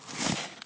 cardboard move

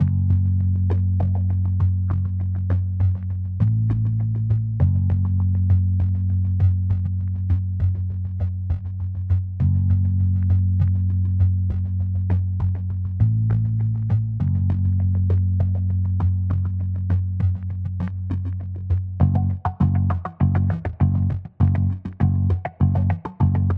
Menu Music; Kolbeinn

